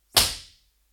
impulse response; Esercitazione-Gen2009

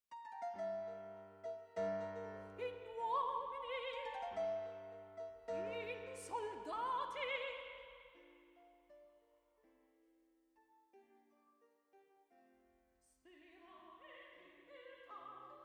Music Panned, Ambis; DTS

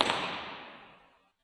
IMP RESP Teatro Alcorcon; Madrid

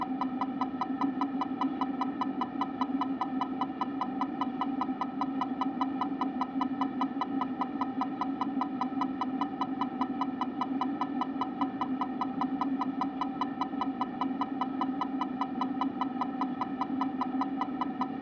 burst 16ms; termocoppia 3; CESI; LaSpezia

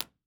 IR Bin Front Left, Listening Room; IR Binaural 16bits

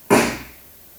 IR; Corso acustica

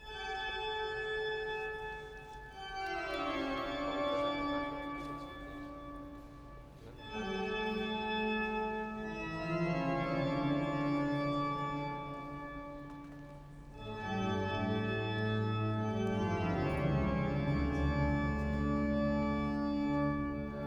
Org short, Organ; B-format; Organ-BG

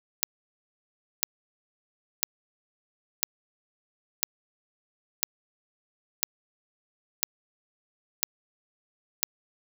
Impulse train

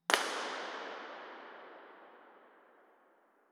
IR sweep, Cathedral Sound System; IMP-RESP; Bergamo

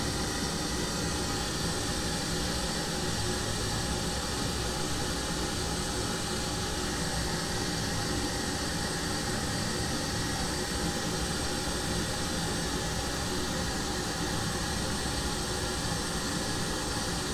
Pink Noise; Corso acustica; Esercitazione-Gen2011

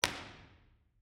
B-format IR, Casa Della Musica; Eigenmike; Impulse Responses

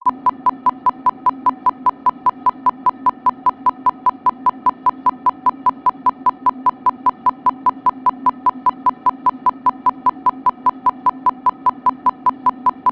burst 32ms 1kHz; Mic2 guida; CESI; LaSpezia

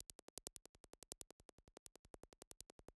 Dirac; EM32 HOA 3rd 16ch ACN SN3D; Eigenmike; Scala 2010

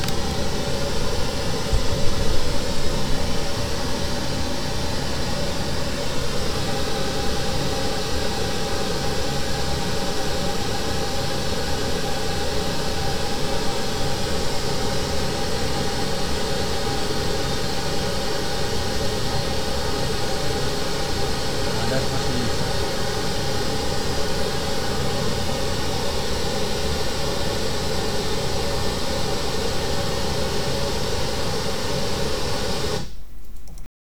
Pink Noise

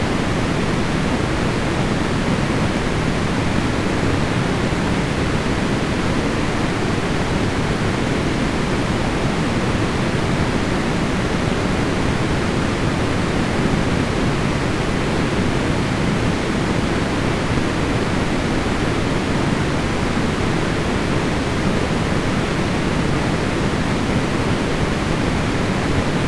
pink noise filtered

